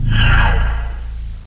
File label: Teacher Zoom Away; Let's Discover Massachusetts